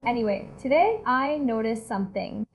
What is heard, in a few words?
beat